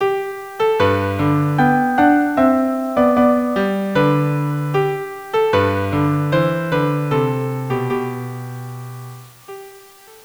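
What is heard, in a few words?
violin cello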